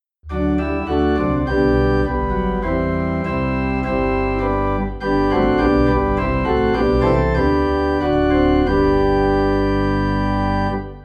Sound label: Organ Low in G; Christ the Lord Is Risen Today